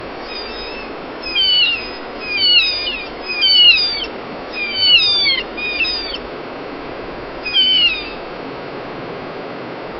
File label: very slow soft call of, coastal